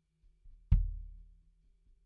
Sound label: BD In